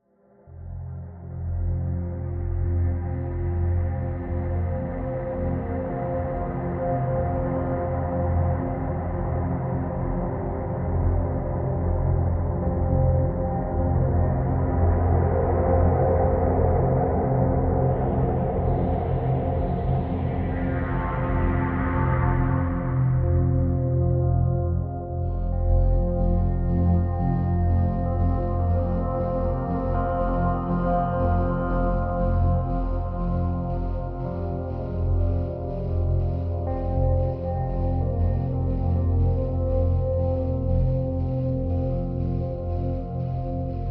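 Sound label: Drn Dimensional F, drone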